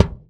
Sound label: Tok, Kicks; Airwindows